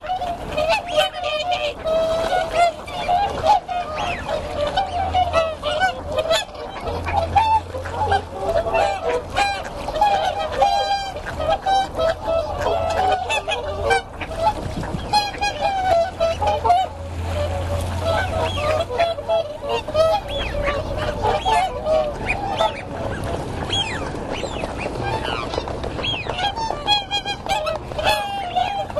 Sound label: waterfowls